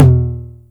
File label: Roland909